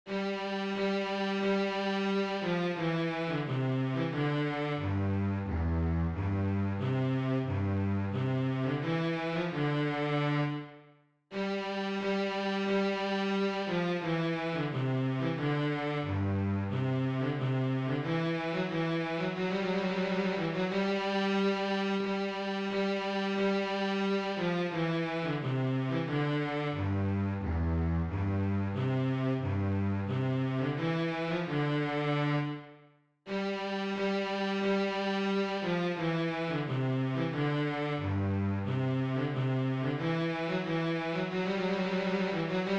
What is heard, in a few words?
Trumpet; Trumpet Tune